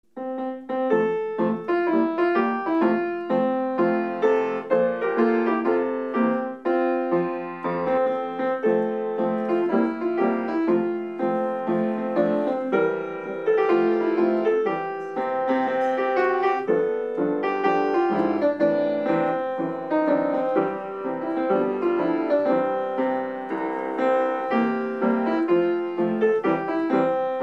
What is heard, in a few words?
piano; Effi